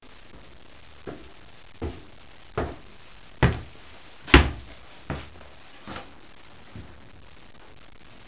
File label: footsteps; Broderbund Print Shop Multimedia Organizer Deluxe Edition